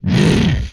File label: Inhuman Growl, Vocal; retro arcade